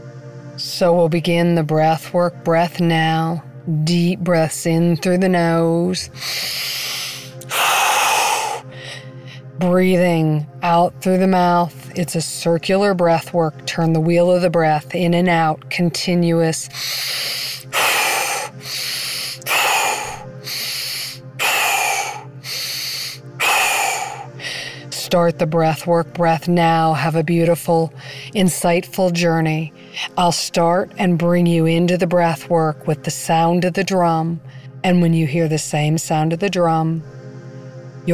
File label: Shamanic Breath Meditation